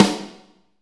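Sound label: snare, Medium Rock; Northstar; Drumscapes Roland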